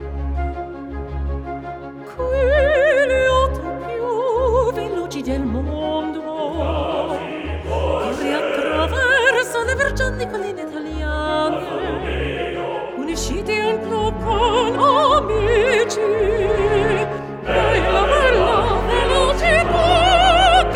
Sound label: WOODWIND RUN; Gear Club Stradale